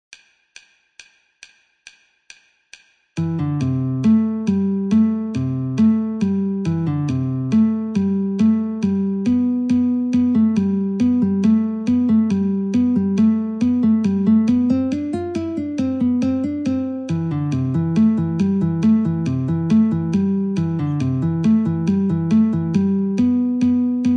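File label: Ragtime Guitar metronome 69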